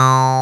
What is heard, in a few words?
MATRIX 12, OB Synths, Analog; E-MU Formula 4000 Series Vol. 3; Analog Odyssey